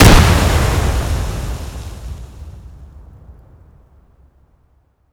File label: explosions